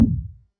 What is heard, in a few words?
modal open, container